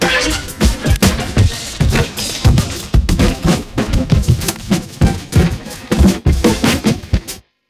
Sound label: breaks